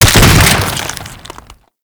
Infested Hive Explosion; Gameplay; Warframe; Corpus Infested

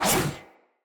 Sword Whoosh Heavy, Weapons, Player, Swords; Garren Sword

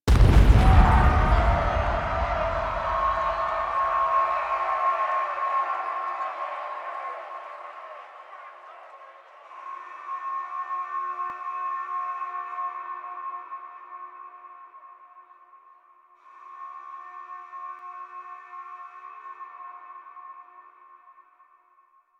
Jail Break; Warframe; Wolf Of Saturn Six; Episode One; Cinematics

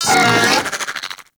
Arachnoid Wraith Vocal Attack; Warframe; Corpus

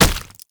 Proto Infested Step; Enemies; Steps; Warframe; Brachiolyst

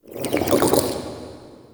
Interior Gurgle Slurps, Infested, Ambience; Misc Indoor

